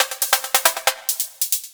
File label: percussion loops, Techno; PS-45